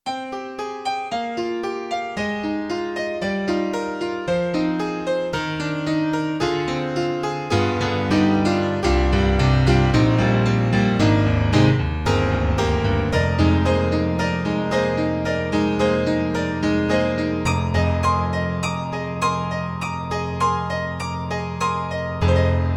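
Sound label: Alesis NanoPiano, Acoustic Piano, Brite Grand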